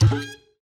parrot plop; battle

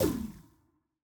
ball bounce heat; CrossCode; battle

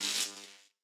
shock; CrossCode; battle

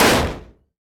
hit mechanical hard; CrossCode; battle

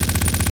parrot gun loop; battle

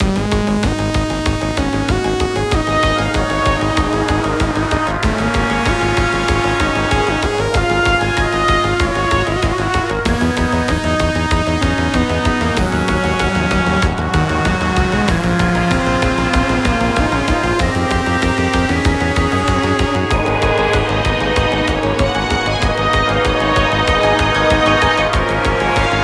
Exponential; CrossCode

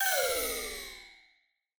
hole fall light

